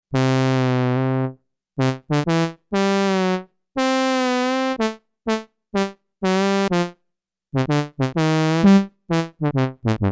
trombone, Korg DW-8000; Factory Patches

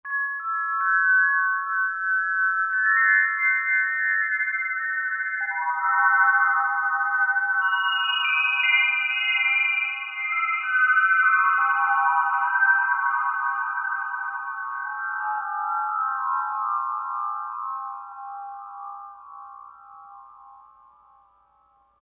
Bell Tree, Korg Wavestation A-D

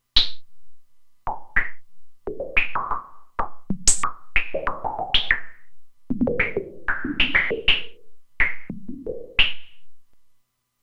Noise Shot, Roland Alpha Juno-2; Factory 2

